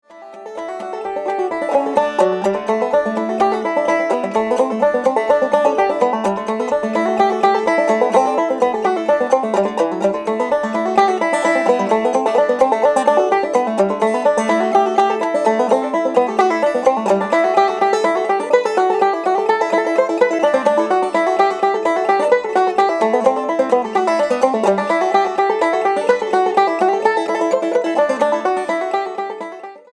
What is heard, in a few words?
banjo, banish set